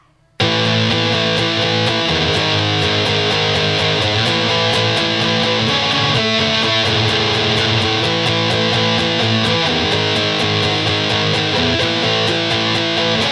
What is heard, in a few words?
Catchy dischordant; lockdown over